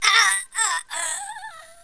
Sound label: death; alienarena; invader